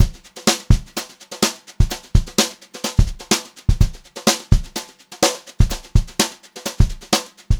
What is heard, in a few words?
JZ BEAT, Brushed Drum Loops; USB Soundscan vol.39; AKAI; 90 s Sample CDs; 126 JAZZY